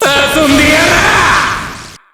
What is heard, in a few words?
overdrive; terumi